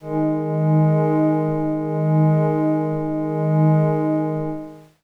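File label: Glass Howl Kawaii G sharp 6; pads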